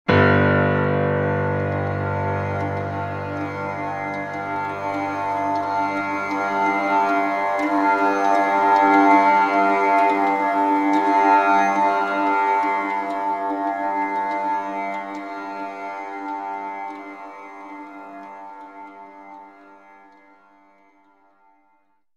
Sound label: Tambura